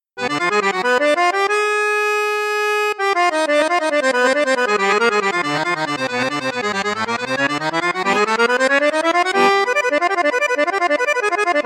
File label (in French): registre basson octave grave basson flute juste, accordeon; cavagnolo professionnel 10